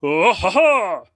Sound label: Yelp; Hollow Knight (gamerip) (2018)